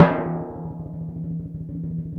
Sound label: Orchestral Perc, Timp FX; Roland LCDP03; Ambo TimpRol